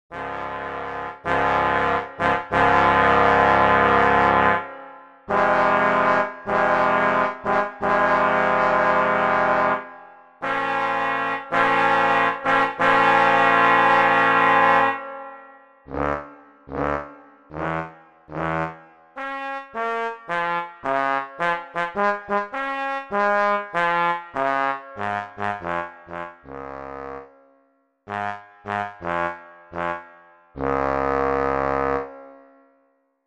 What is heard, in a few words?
Trombone